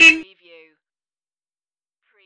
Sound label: funny horn